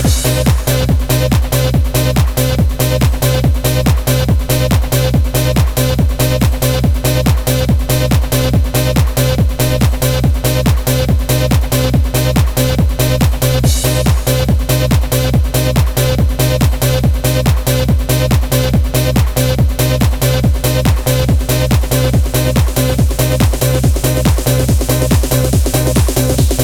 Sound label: Wavestate Instrumental Mix; Live At Innercity - Amsterdam RAI; Evolver